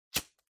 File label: Lighter Flick